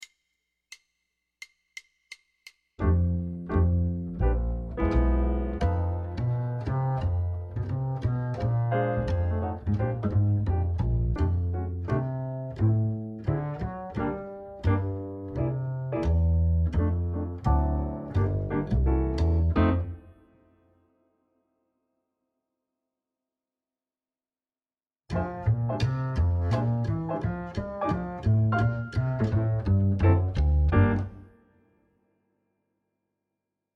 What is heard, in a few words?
Drumset